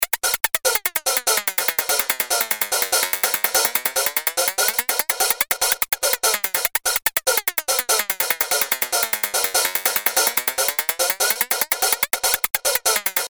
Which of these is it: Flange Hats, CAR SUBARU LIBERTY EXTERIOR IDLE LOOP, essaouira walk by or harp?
Flange Hats